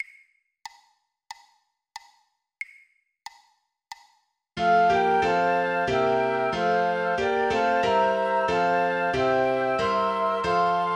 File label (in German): Flöte Klavier; 92 bpm, 2x; It Came Upon the Midnight Clear; weihnachten